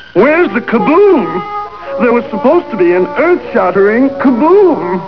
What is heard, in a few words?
kaboom